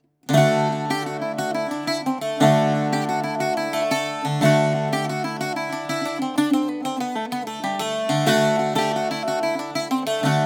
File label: bouzouki